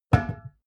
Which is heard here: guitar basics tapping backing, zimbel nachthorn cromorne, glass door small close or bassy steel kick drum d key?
glass door small close